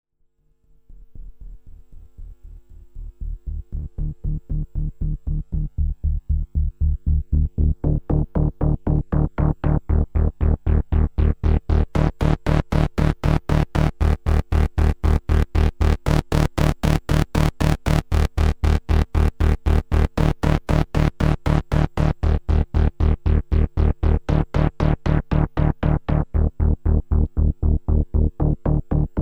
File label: Impulse Command Jetflanger; DRP-20